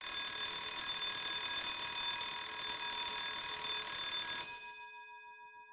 alarm; zones; crime

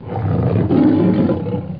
roar

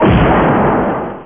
EXPL; BombSquad

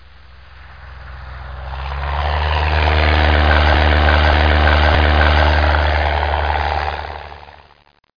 plane; Professor Multimedia

